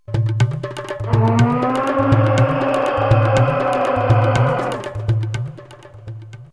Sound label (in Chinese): 悠扬背景音乐; 课件声音